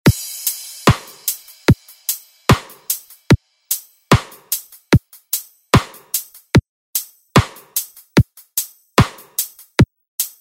80s Re Drum; DJ Mhark

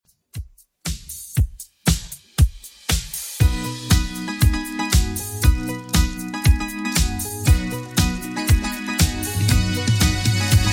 80s Redrum 118bpm

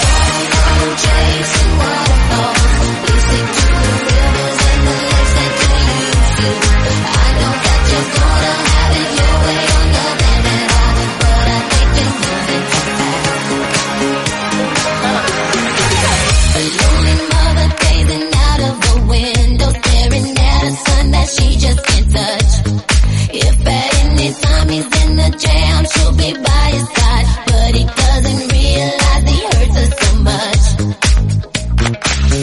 Disco 118bpm Eb; 1994

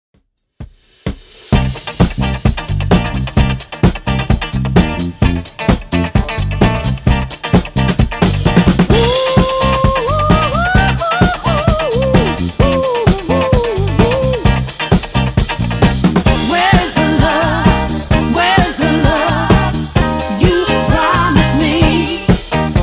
70s Redrum 130bpm

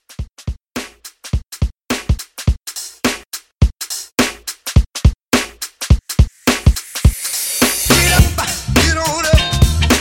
70s Re Drum

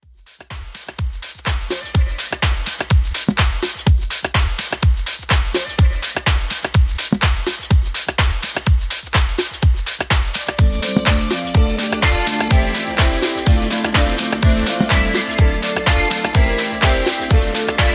80s Club Classic 125Bpm

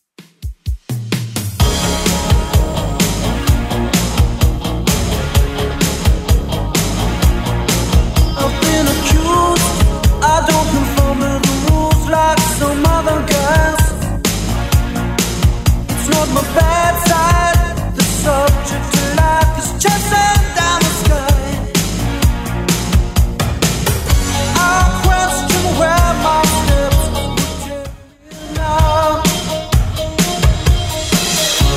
80s Redrum 128bpm

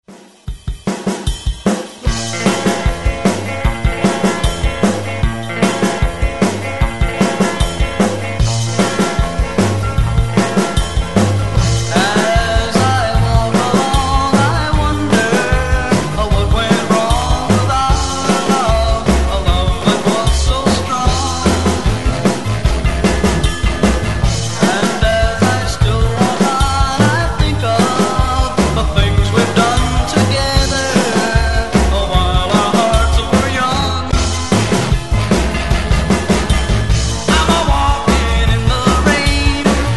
60s ReDrum 152Bpm; DJDOLLS

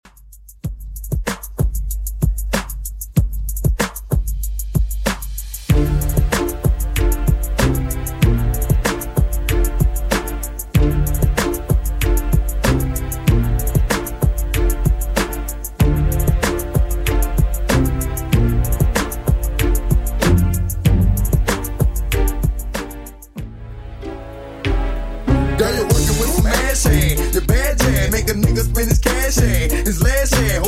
90s Hiphop Redrum 95bpm

